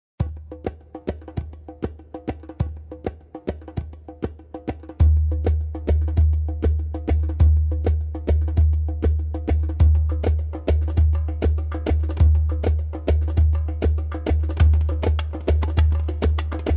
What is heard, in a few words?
Ana WeOudey, Taksim Oud